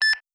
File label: local start, radio sounds